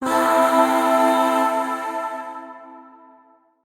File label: Shawzin Pent Maj Chord I; Warframe; Zariman; Void Shawzin; Chords